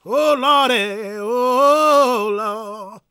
GOSP MALE, Vocal Planet Gospel

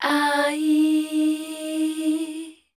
MELODIC, Voice Spectral; Best Service; Voice Spectral II

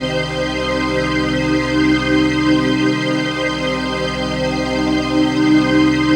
PHASE PAD, ATMO PADS; Best Service; XX Large

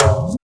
TOM

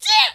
VOC

